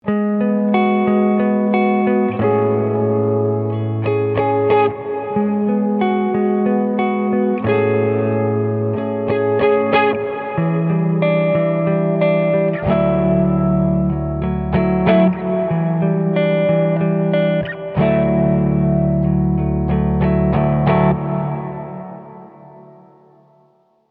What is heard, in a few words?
hall; BigSky; reverb; Soundcheck